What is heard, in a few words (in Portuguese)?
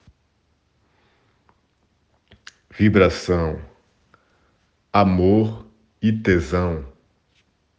vibracao